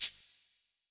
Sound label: Cabasa, percussion reverb; MARKYDRUMS